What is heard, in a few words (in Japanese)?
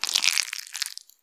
膣内射精